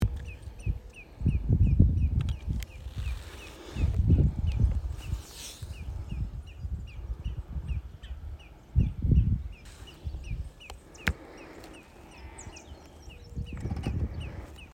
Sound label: lesser yellowlegs